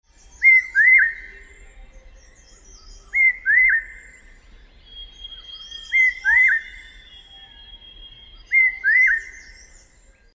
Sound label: large hawk cuckoo